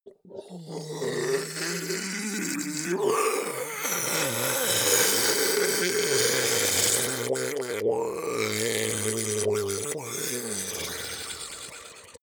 ice golem melt groan; koerpergeraeusche; comedy-cartoon; soundpool

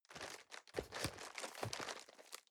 cardboard box medium turn soft; objekte

